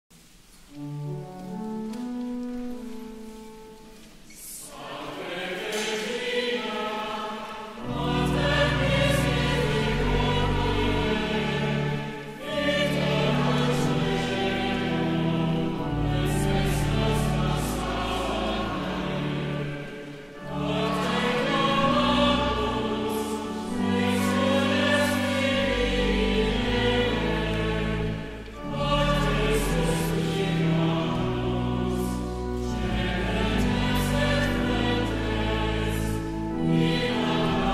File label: Salve Regina sung at a Catholic Parish; Brooklyn Diocese